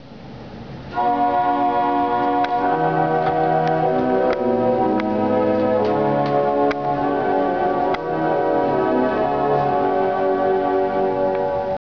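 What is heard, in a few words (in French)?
voix céleste, orgue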